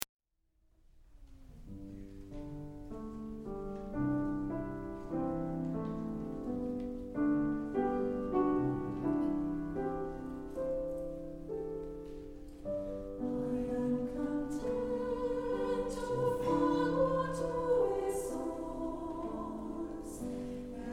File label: Blest By Everything Voices, music for mixed choirs